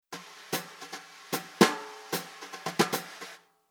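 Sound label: Rhythm 4 beat Brush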